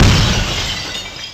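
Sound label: TV crash; cubase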